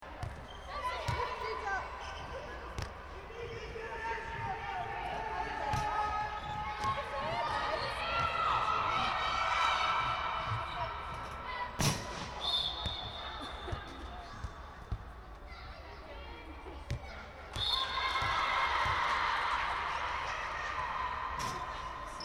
Interior netball, Sports Hall, Interior: Ambience, Netball Game, Reverberant; Backgrounds; Stuart Duffield